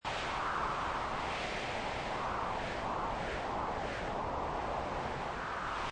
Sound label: Wind Busy Loop Short, Busy, fierce wind. Seamlessly looping sound; Weather, wind; short version; Wind Sound Effects